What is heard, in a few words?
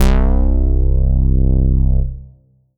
Synth Bass Stab C3